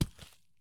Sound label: soil run; Escape from Tarkov